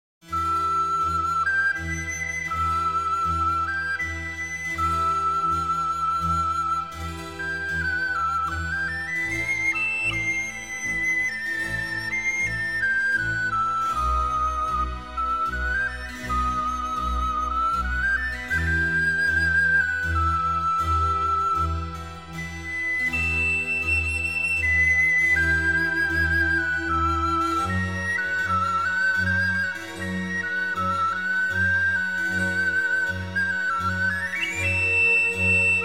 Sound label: Flautino Concerto in C Major, Largo